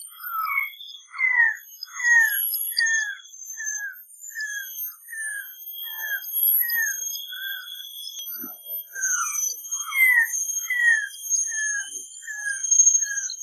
Raffles's Malkoha calls, Ulu Kinta Reserve Ipoh Perak Malaysia